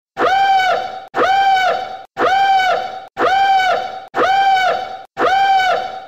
Alarm; Stardestroyer

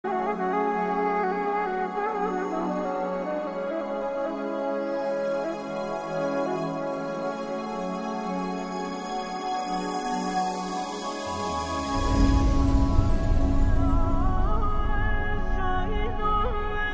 Music of Iran

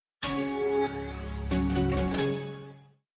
Intel Ring

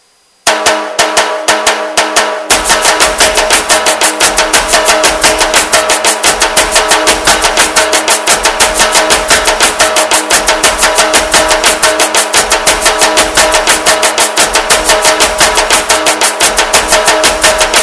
Nasik Dhol

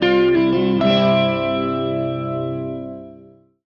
Text Message

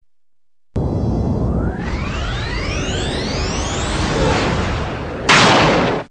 Warp; Star Trek TNG

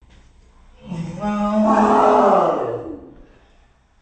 Roar Boy

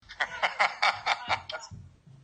Daddy laugh

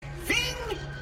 Zing

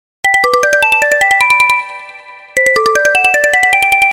Xperia Ringtone

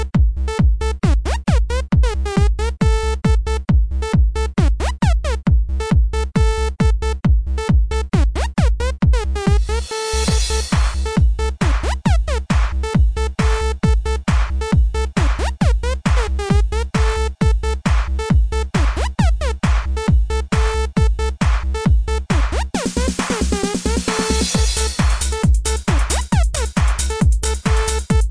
New Age

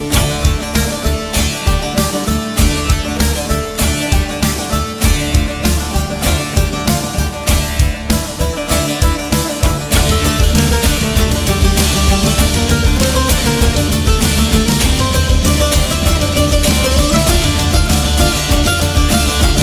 baglama; Cukur

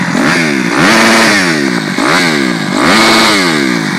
Crf 250r Rev Limiter

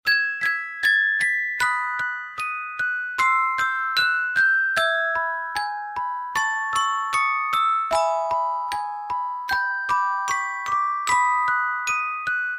Sprinkle Twinkle

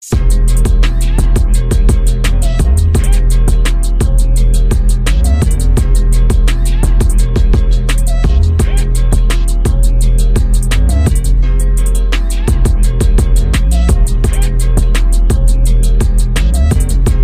Freeverse Instrument

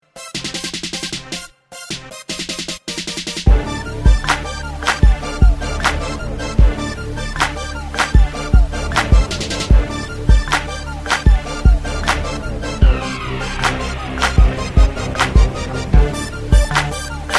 Hip Hop Beat

